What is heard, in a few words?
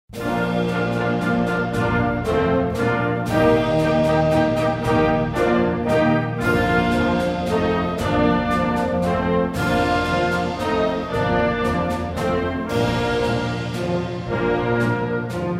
Fanfare And Minuet